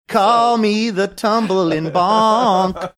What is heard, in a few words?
singing call me the tumblin bonk